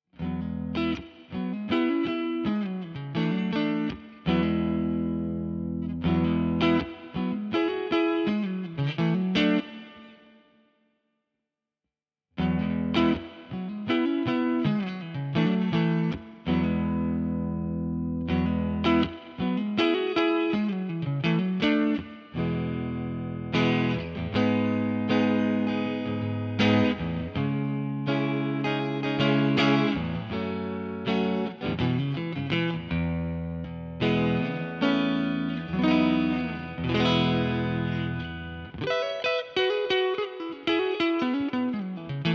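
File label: HSS Neck Clean, electric guitars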